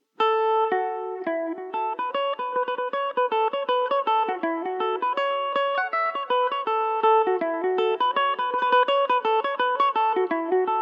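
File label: A major revelation, Irish mandolin; buck morans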